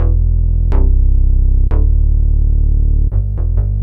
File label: DEEPHOUSE BASS 125 A